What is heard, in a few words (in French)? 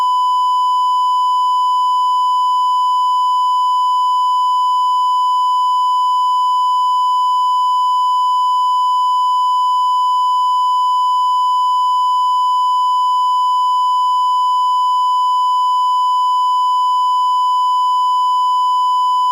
triangle sym